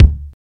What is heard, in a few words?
prominent kick drum sound d key